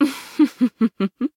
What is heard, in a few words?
laugh; MissFortune; jp